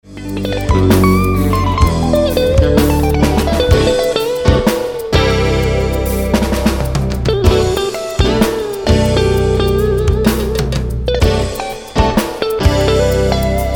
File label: Reggae Metal